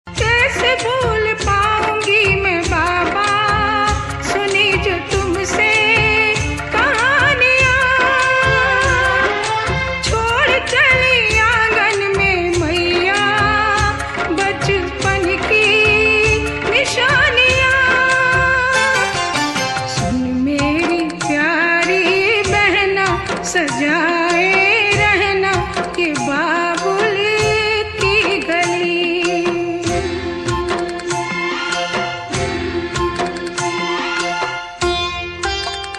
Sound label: Wedding Song Sad Song